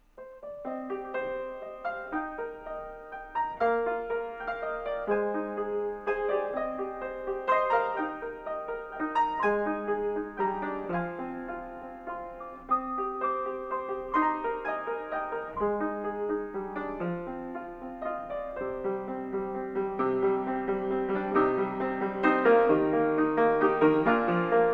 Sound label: acoustic